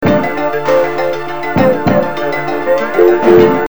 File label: depressing rock music